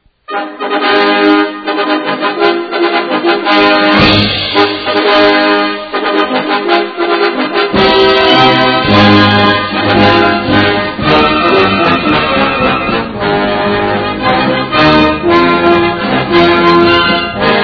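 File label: Anchors Aweigh Fanfare, Naval Academy Band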